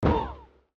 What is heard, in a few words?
POOF SOUND; DISNEY